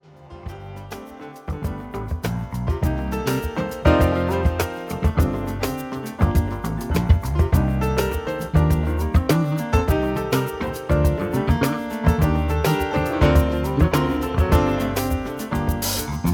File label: Fusion Piano